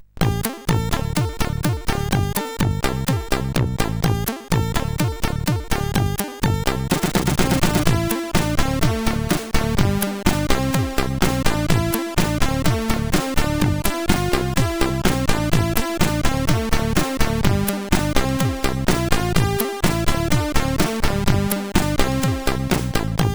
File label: Remix sid CSG8580R5; No Name Remix